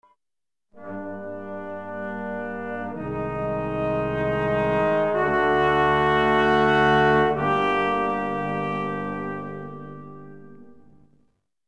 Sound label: Dungeon Transition